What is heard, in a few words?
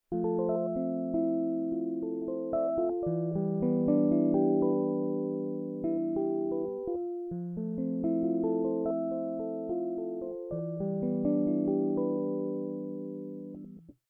hohner pianet t clean